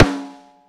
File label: Snare, Drum & Bass; Total Drum&Bass; 90 s Sample CDs